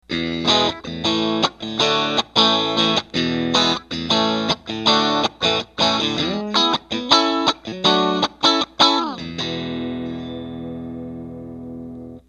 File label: cable